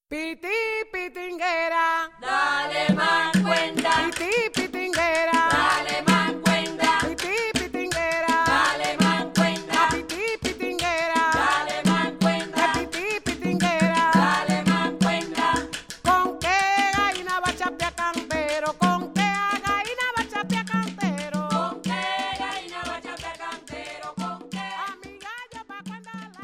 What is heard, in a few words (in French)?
Chants et rythmes bantous; latin; Yuka